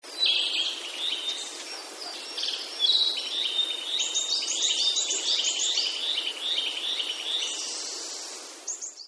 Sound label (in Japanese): kibita